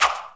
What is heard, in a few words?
Long Bow Release; Effects; worms